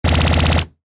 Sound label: consecutive impact, mahjong; ボードゲームやカジノ、麻雀の効果音です。